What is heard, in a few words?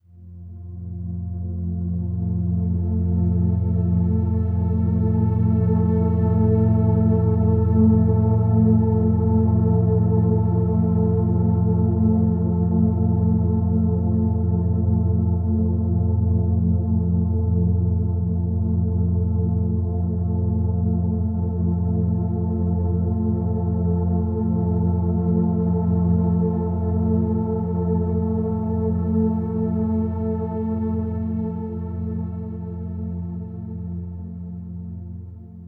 Overlay Drone